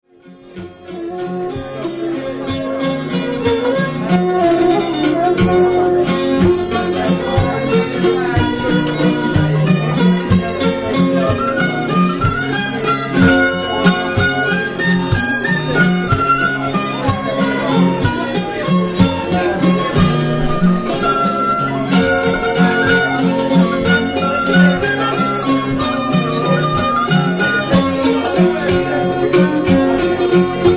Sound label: Mando